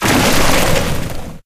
paint jump polarity splat

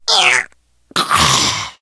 death scream, survivor voice

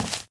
white tile, footsteps

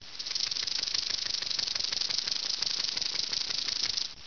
snake, animal; ambient; Source Engine; cstrike

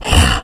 become alert, infected male

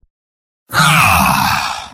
negative vocalization; spy; video games; source engine; tf; mvm

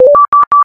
system message alert, ui

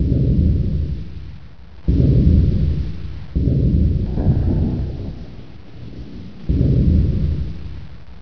mortar background; ambience

